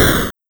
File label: explosion; Juegos Retro; Galaga; Galaxian1